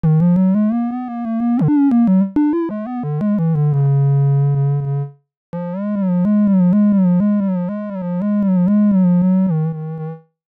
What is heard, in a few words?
sax sub bass